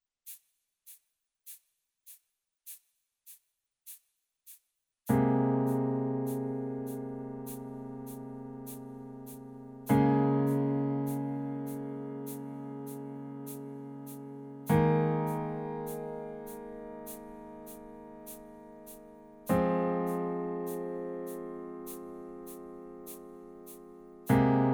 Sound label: Chord; LinkAge